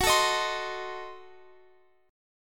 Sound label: Gb Diminished 7th Guitar Open F; Gbdim7; strummed; x,x,18,16,18,19